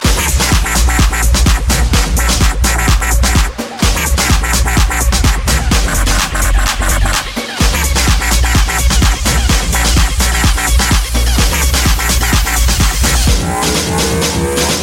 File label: Fast Techno; Droid